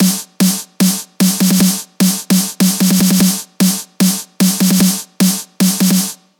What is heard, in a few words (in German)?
brainworx bx console snare unbearbeitet